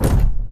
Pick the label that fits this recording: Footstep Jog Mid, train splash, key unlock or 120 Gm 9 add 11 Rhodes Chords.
Footstep Jog Mid